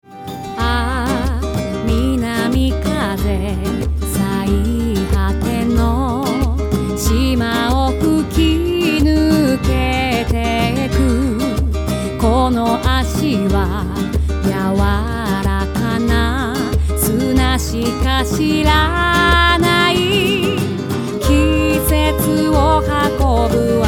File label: flamenco